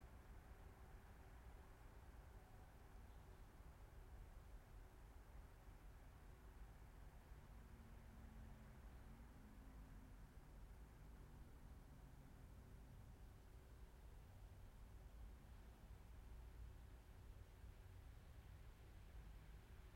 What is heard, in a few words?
yamanakako sound